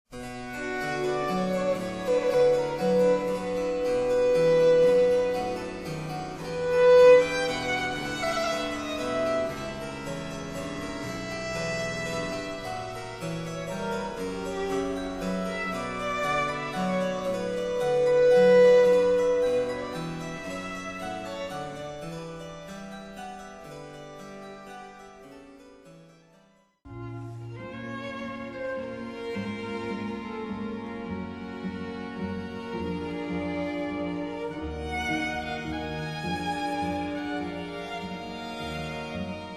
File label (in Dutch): vioolsonate; bach; erbarmedich